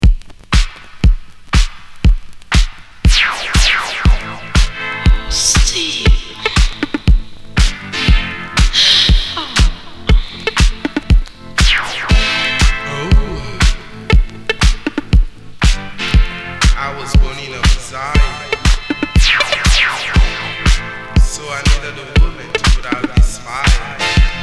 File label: disco jam